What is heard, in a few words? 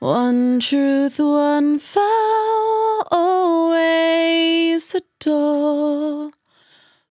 100bpm Bm, Velvet Vocal; Zero-G